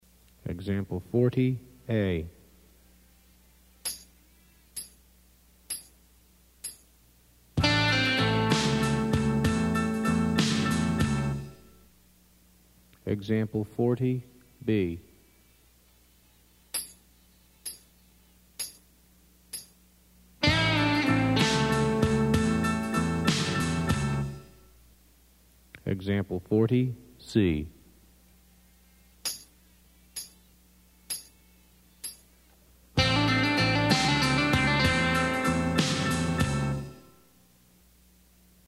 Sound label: blues riffs for guitar